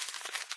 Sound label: recycle, windows xp; operating systems